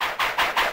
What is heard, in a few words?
Combo; RedDot